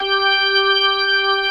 ROCK ORGAN

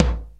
RX7 NORM TM; Crypton - DrumBase5000

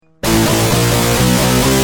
Guitar, Industrial

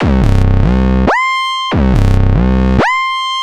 Analoop, Industrial

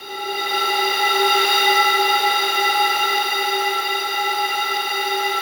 SLOW STRINGS

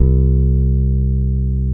BASS C2, Electric Basses; PlatinumPhattCD2; E-MU Producer Series Vol. 8 – Platinum Phatt

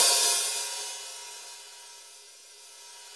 CYM 14, Real HiHats, 14 Hi Hat

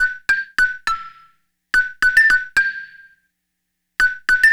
Xylophone; Sonic Foundry (Sony Creative Software); Stylus Pressure Urban Grooves on Digital Wax

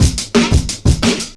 Toy Drum 175, Drum&Bass Breaks; EZ Rollers

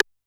Analog Side Stick; Yamaha PSR-E323; Dance Kit

